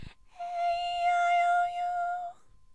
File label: aeiou High